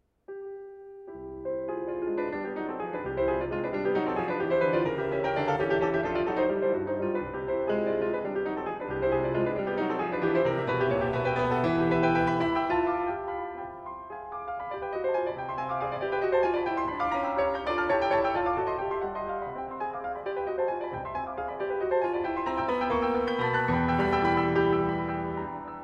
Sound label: Etude Op. 10 No. 7 in C Major piano; F.Chopin